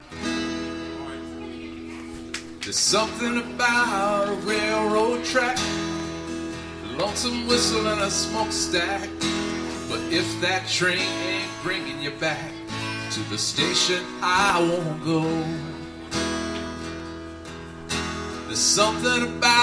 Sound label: Train Bus Plane